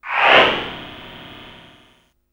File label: FX, Drum & Bass